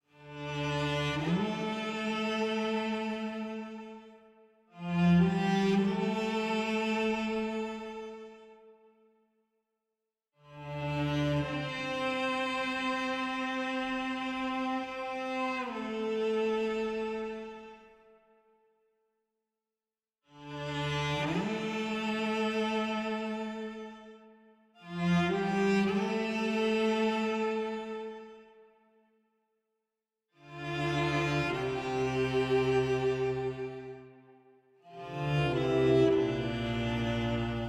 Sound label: cello; fatebinder; Tyranny Gold Edition